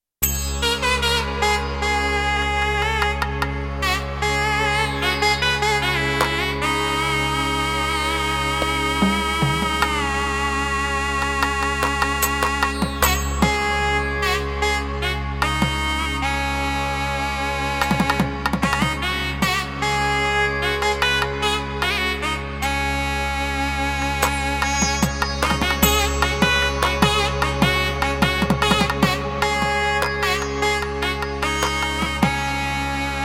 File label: Nadaswaram